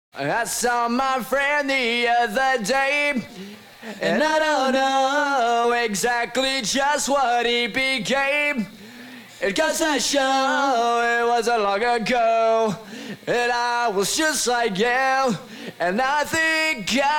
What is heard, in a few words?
vocals